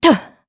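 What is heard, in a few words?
Landing, Japanese; heroes; Seria; kingsraid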